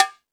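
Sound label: Jungle Percussion, Drum Machines; 300 Drum Machines; E-MU Pro-Cussion-1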